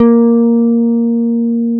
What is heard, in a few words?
FEND P-BASS BF3; Sonic Reality - Vintage Timetraveler